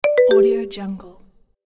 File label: marimba alert notification